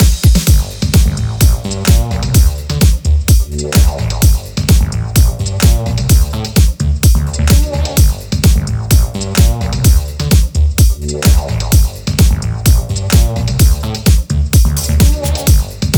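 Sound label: trumpet vs sax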